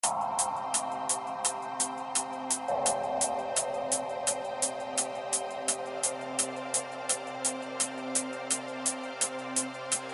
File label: funk ambience